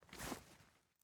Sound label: Backpack Shuffle